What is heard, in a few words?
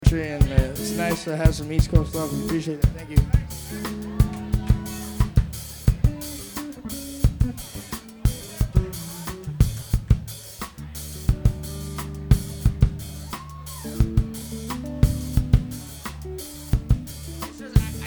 Bass Drum Jam